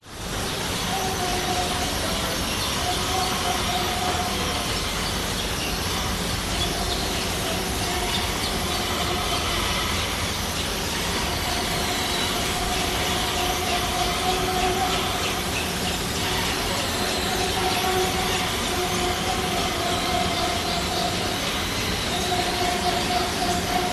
public places city moving walkway autowalk subway 2 people talk, Sydney; cbd australia